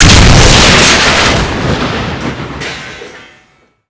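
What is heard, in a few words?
explosion metal armor vehicle; Explosions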